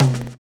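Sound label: SNARE SOUND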